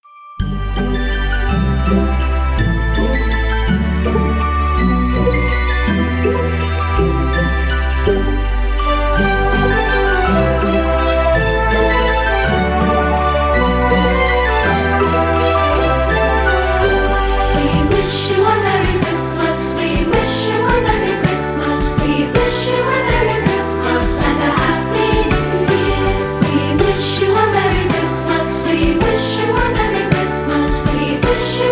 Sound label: we wish you a merry christmas choir